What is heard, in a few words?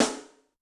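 Open Rim C, Yamaha DTXpress-II snare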